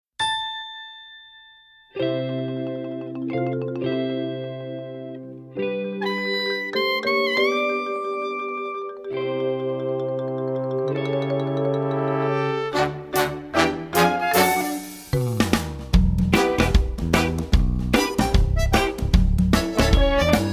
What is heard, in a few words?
Accompaniment; Timon, Pumba